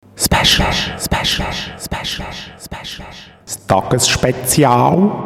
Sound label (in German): Jingle; Tagesspezial